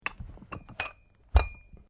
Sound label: Weights Dumbell Move, Gym weight lifting equipment; Weightlifting (Sound Effects:Sports)